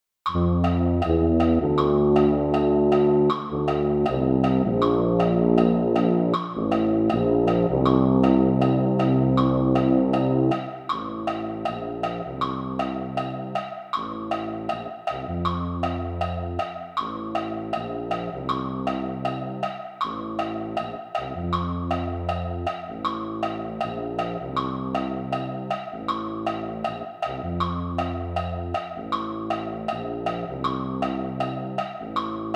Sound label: Tuba; concert corps